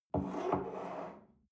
espresso place on table slide soft, restaurant cafe